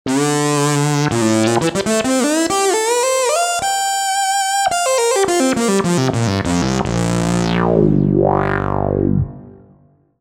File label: 70s lead; TURBO VL